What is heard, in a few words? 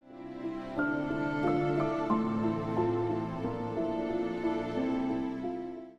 chime